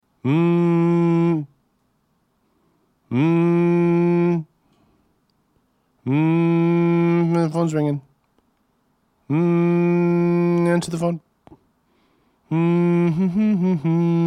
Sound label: Hmmmm